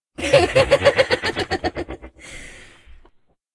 laughs; Nemira; SyrinScape; Iris hill manor

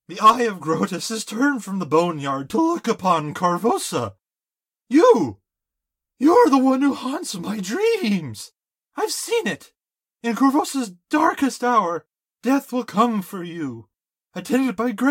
Man coughin; Mad Prophet; SyrinScape; City gone mad

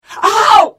Hit; The Elder Scrolls III - Morrowind

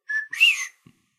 Whistler bird; SyrinScape; Syrinscape unplugged; Jungleplanet dinolaser battle

